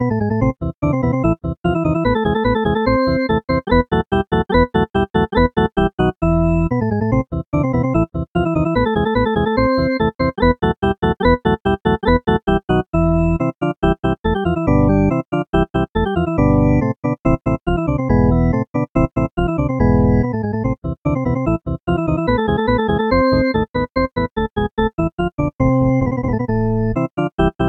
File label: drawbar organ